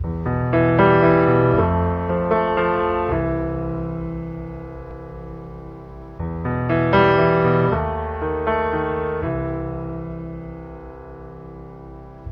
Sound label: piano, Loops Sad